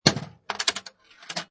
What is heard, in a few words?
Pinball Sound Clunk Followed By Various Rattles; Video Game Sound Effects; Lynne Music